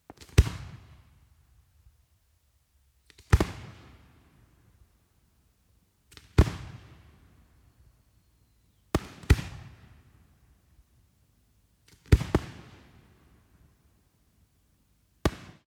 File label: fireworks single shots